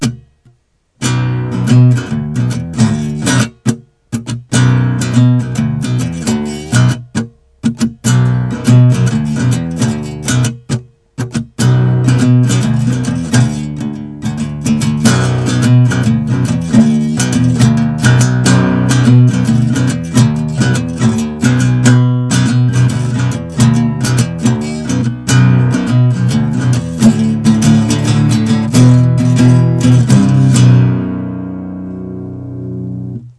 acoustic

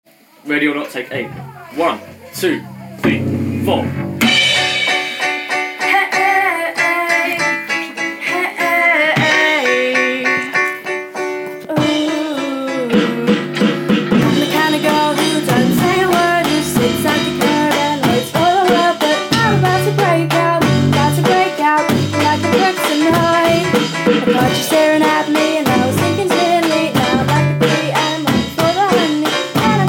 group recording intro verse 1 and pre chorus

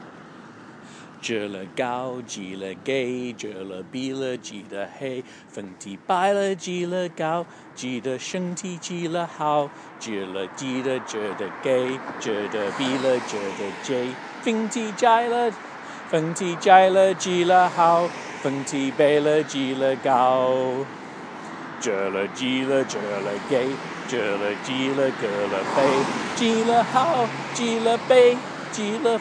random chinese rap

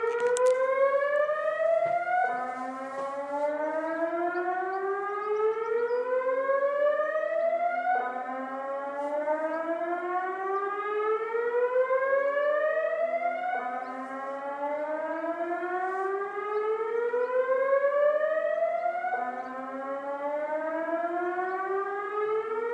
monthly siren test

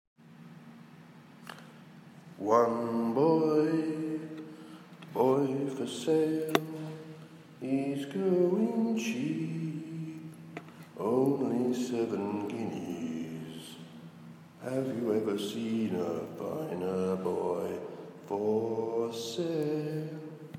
echoy singing in work basement

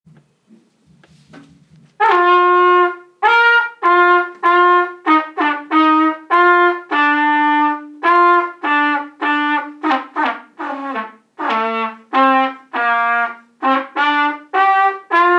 trumpet solo

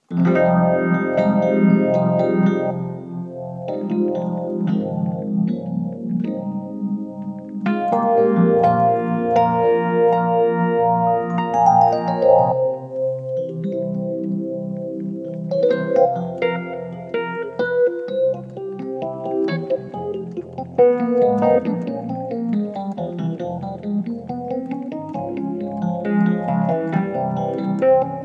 rhodes piano

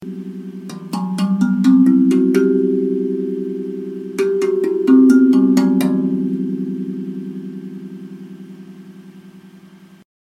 hapi drum akebono e scale with ambience

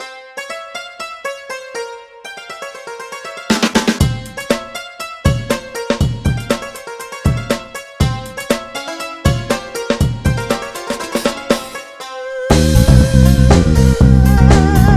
asian jingle

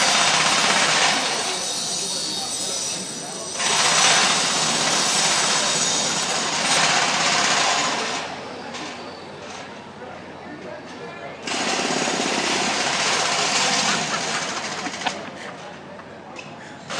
peaceful lunch

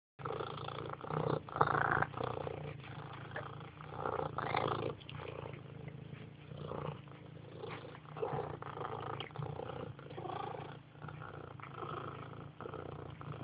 purring and eating turkey; mungo

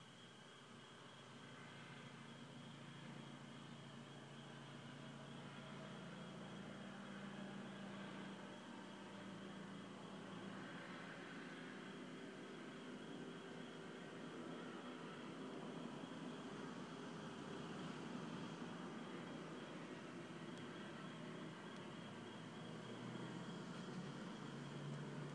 noisy morning

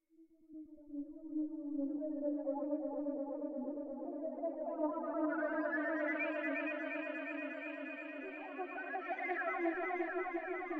Animoog iPad

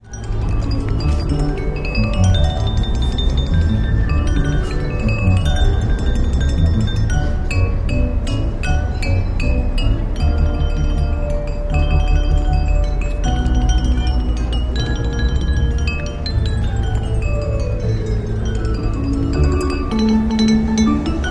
busker plays william tell overture on glasses

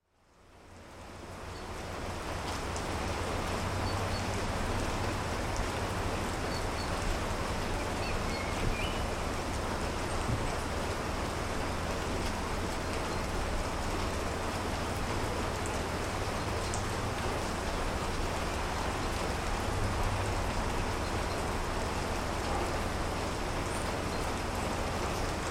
a rainy day in Piano Maglio

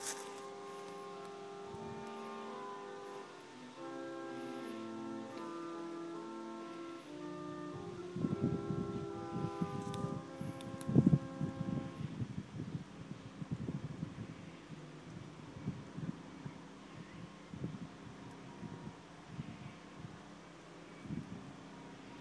church organ playing recorded outside; st lawrence church corringham lincolnshire

